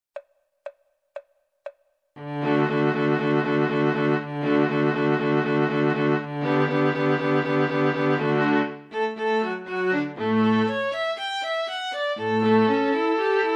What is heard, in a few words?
flute qartet; フルート用伴奏; mozart; kv285